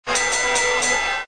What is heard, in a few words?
Gong; SouthPark